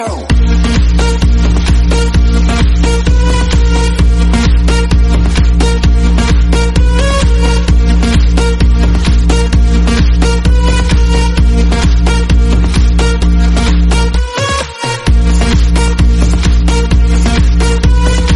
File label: crazy techno remix